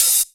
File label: Open Hihat